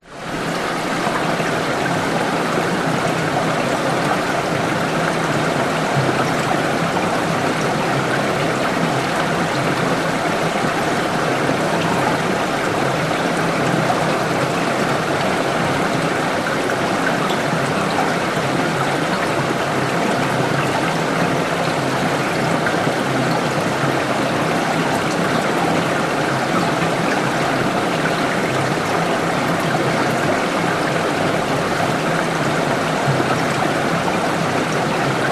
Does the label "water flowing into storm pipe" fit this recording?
Yes